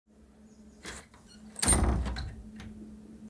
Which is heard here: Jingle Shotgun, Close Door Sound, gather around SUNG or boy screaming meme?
Close Door Sound